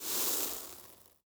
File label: room ash ready; Shelter